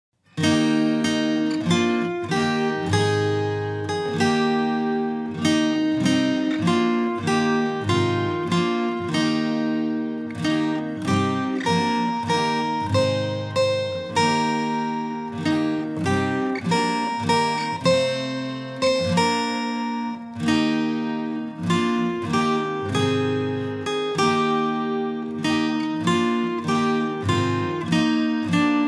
Hymn, Go to Dark Gethsemane (Instrumental)

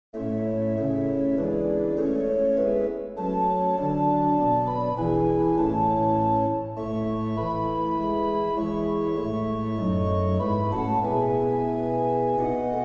Eternal Light Shine in My Heart Organ 3 verses; Warrington; Hymnal 1982

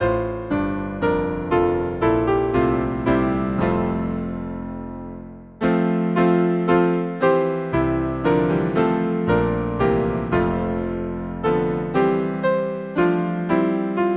Hymn piano; Hark the glad sound, Richmond

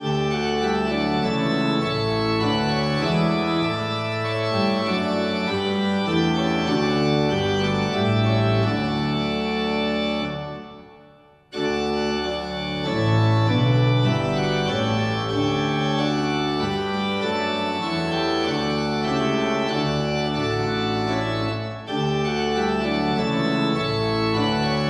Hymn organ; God of saints to whom the number; Halton Holgate

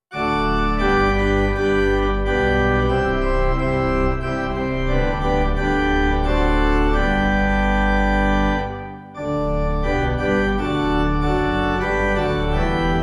Hymn, organ; O for a thousand tongues to sing; Azmon

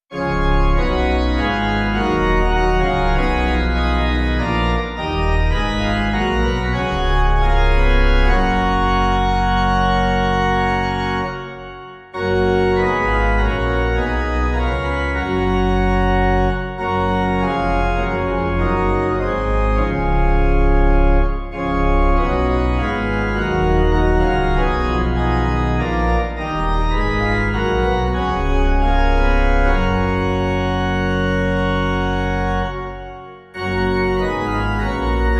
Hymn, organ; Awake and sing the song, St Ethelwald

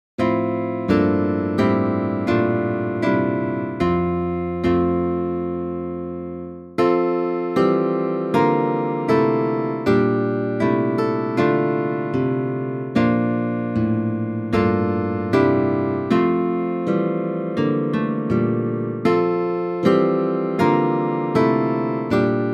guitar; Hymnal 1982

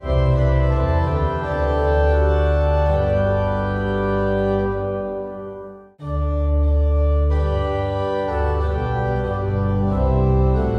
Hymn organ; Jesus Lives, Mowsley

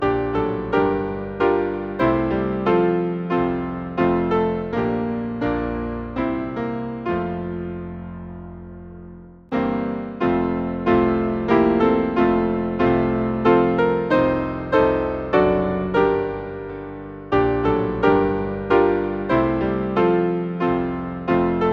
Hymn, piano; Away in a Manger; Cradle Song

